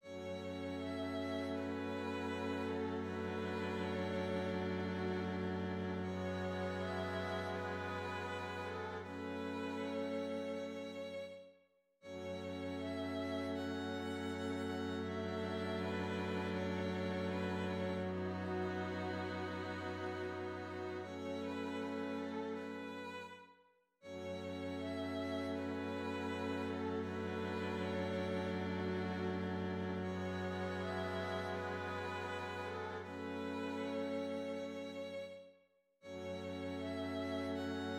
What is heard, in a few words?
String Ensemble F m to Ab